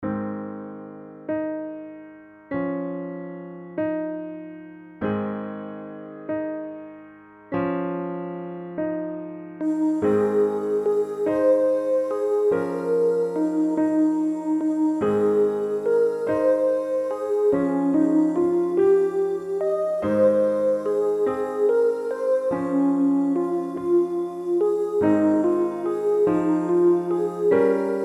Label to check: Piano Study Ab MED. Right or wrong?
Right